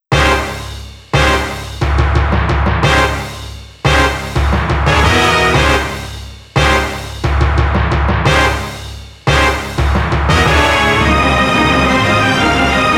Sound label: Shock; SaGa Series 20th Anniversary Original Soundtrack Premium Box